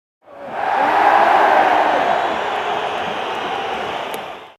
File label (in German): Stadion Buhh und Pfiffe